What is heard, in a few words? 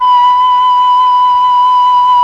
FLUT1; ILIO; Propeller Island Legendary M400